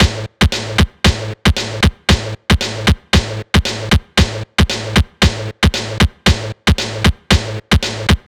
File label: Ethno, 115 BPM, Breaks; loops; Loopmasters; Jon Carter & Alex Blanco; Block Party Essentials